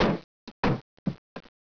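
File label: warehouse; Caesar 2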